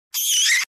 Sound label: Sharp Scream